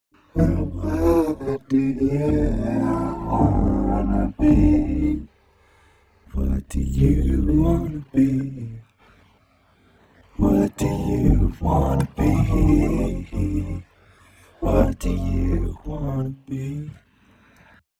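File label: what do you wanna be c major, vocal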